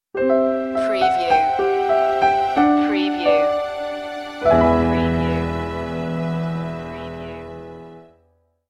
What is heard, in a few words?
idents piano